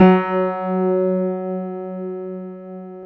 PIA MICRS, MICRO-PIANO

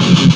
GRAT HARD, Ragga & Dub Reggae; USB Soundscan vol.27; AKAI

